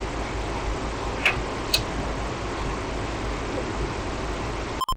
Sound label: EVP Knock Click